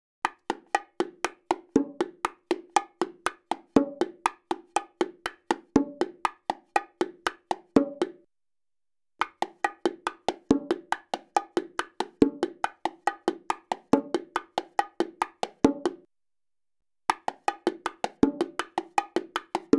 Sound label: Salsa Picante; Masterbits; World Zone VOL-3